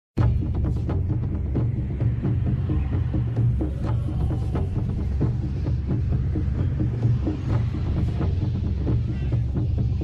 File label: drums; jumanji